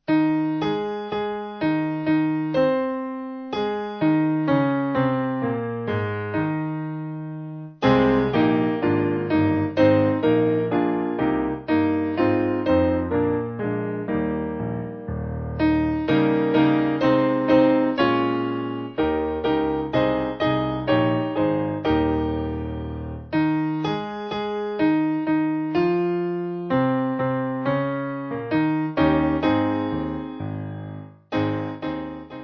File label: We Plough The Fields, Piano; Wir Pflugen